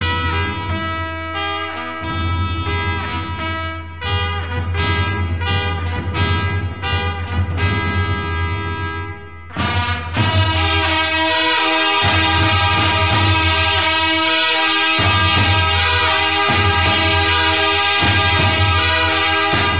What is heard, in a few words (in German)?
Zapfenstreich; Potsdamer